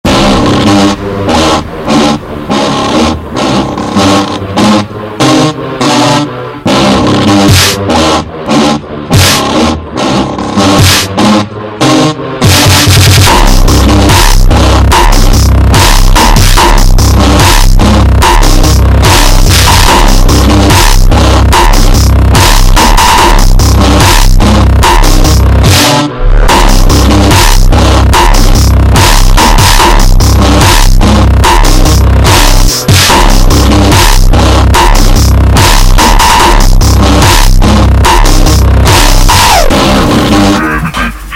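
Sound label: Tuba Hardtekk super Slowed, funk remix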